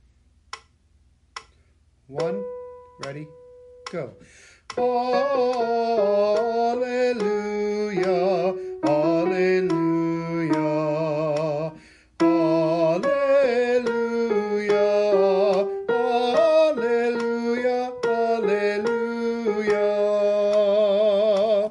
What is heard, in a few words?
alleluia soprano